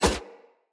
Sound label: Split Sword Whoosh Fast; Weapons; Player; Swords; Starter